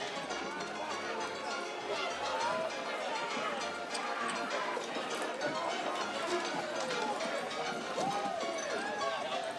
ambience Free Spin; netent; deadoralive

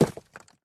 symbol Stop On Stone; netent; eldorado

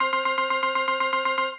count Up Loop; netent; deadoralive

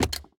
reel Bounce; netent; multipliermayhem

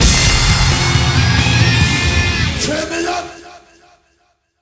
medium Win Musical; netent

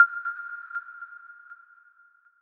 reel stop; netent

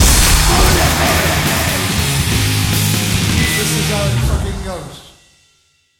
large win musical; netent

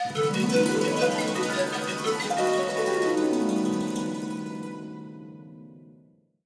outro Free Spin; netent; eldorado

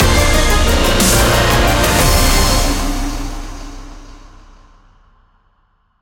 big Win Loop End; netent; bloodsuckers2

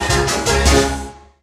small Win; netent; deadoralive

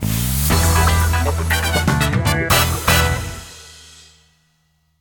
outro Free Spin, slots; kingofslots

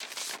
map open; netent; eldorado; gonzo

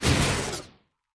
powerdown; netent; whenpigsfly

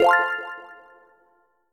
count Up Loop End; netent; twinhappiness

